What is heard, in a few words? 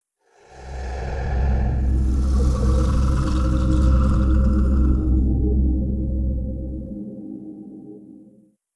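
Woosh Music; from dust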